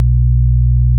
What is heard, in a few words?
micromoog